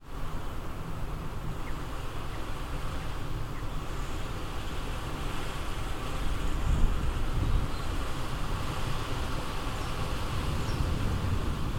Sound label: nature wind breeze against slighty open window from inside